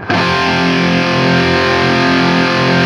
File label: open F loop, guitar; East West; Steve Stevens Guitar Samples Collection; OP CHRD LOOP